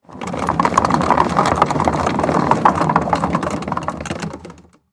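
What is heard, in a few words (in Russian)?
kolesa po asfaltu, zvuki chemodana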